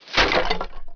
step rubble; SpecOps2 MPlayer Demo

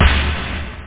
drums snare; OctaMED SoundStudio V1

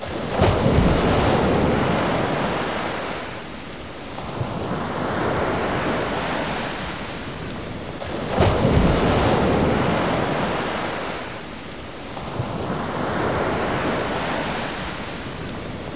wve loop

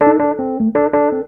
jazz; wavelab

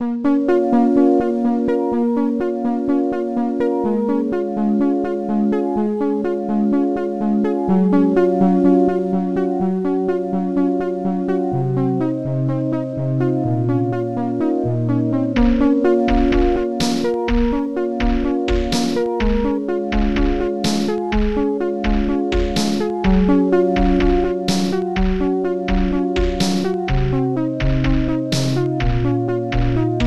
tune

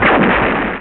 Explosion; SimEarth Demo; Artificial Life

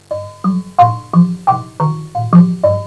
lujon loop, percussion instruments; Sound Effects for Multimedia - Volume 1

